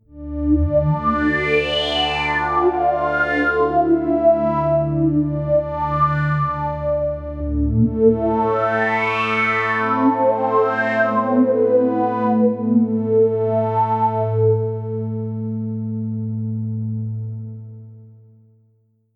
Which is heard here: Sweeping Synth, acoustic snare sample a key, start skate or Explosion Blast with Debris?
Sweeping Synth